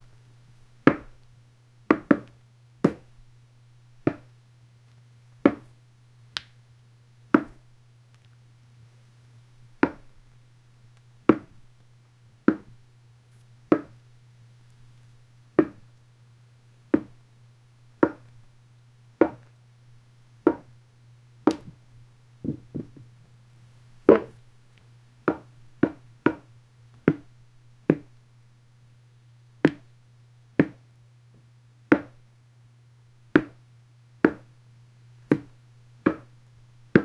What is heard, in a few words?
someone poops on the floo